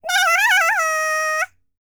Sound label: BLACK SEA, World Voices; ILIO; Vocal Planet VOL-5